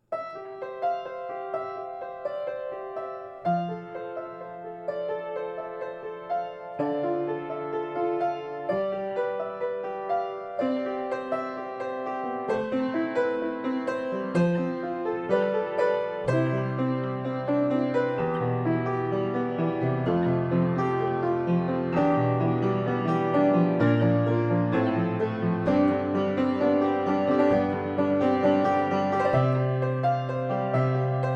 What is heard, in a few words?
Hymn Instrumental